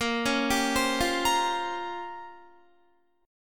A sharp Minor 6th Add 9th A sharp down Guitar, chord; A#m6add9; arpeggiated